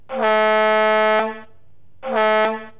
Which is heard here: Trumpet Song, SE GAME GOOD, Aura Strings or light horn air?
light horn air